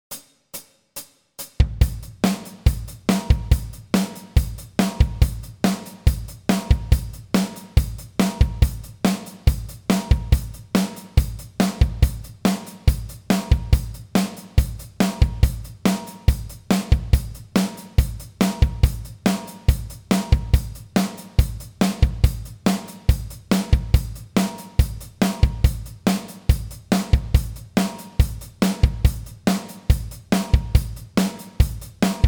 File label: drums